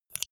scissors close

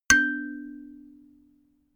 notification kalimba third

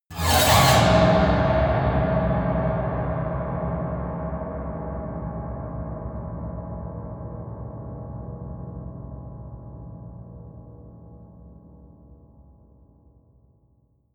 piano twang fast squeal long

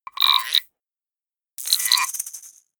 percussion

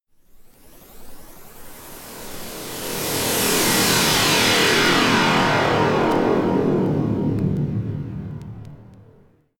alien beam

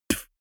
beatbox bass drum dull damped

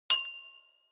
notification bell

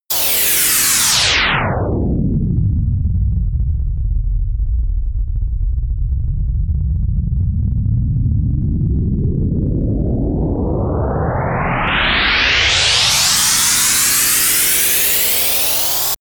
noise spin down drop riser

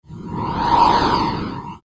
airy smooth phase

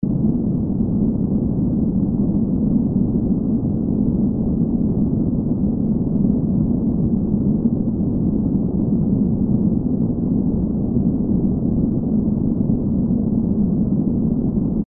underwater mid freq atmo

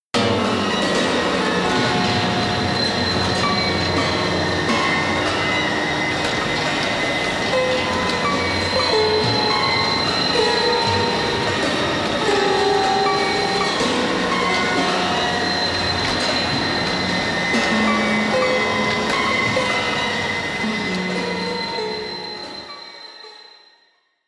broken piano chaos

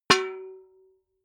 metal bucket hit ring

